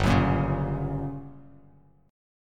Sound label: G Minor Major 7th Flat 5th G, Bass Standard; strummed; JGuitar